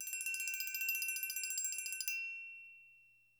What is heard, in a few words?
AGO ROL A, Percussion; Sampleheads; NYC Percussionworks; GJ PERC VOL1